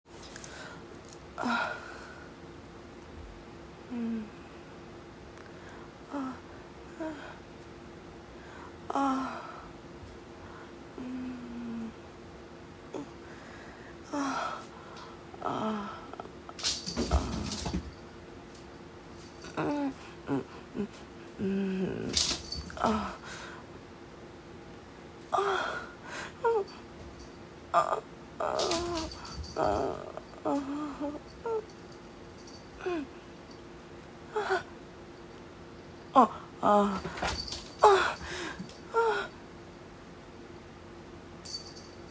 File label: vibrator; F4A; F4M; Short but intense quickie before bed with my vibrator